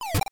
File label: Hammer Action